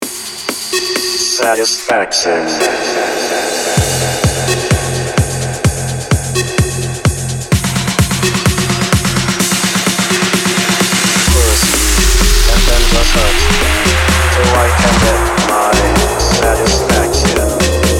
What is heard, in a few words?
Mashup 128BPM